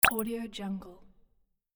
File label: click